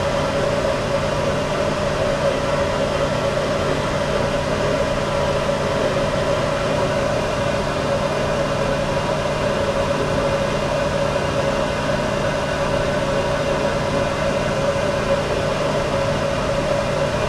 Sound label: Motor Industrial Turbine Spinning